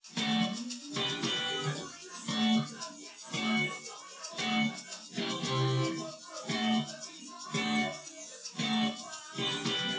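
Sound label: with drums; SiSEC10; underdetermined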